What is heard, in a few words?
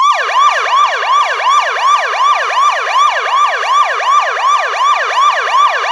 siren police; Call of Duty Modern Warfare